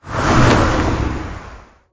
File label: Dragon Loop; Angry Birds Seasons